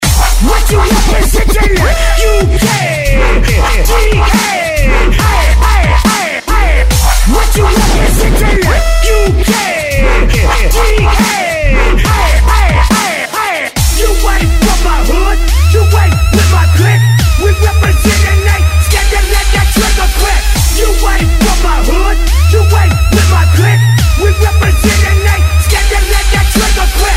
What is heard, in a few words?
dubstep remix